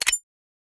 ui player joinroom

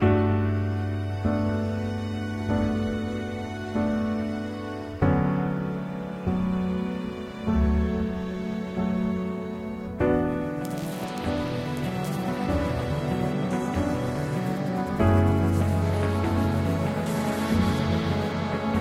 death loop

